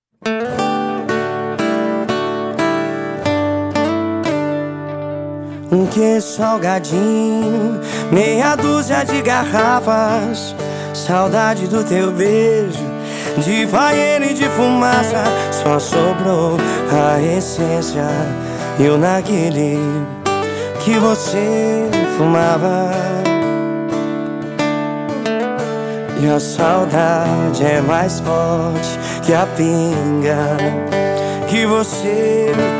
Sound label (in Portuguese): sertanejo universitario sofrencia